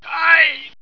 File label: trooper pain